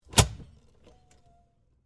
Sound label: Door Open Exit, Foley, Door, Open, Exit, Push, Bar, Stage; Miscellaneous Doors; BlastWave